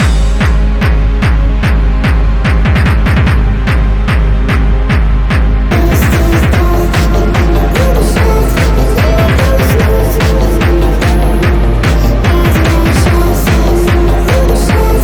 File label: Original Speed Reverb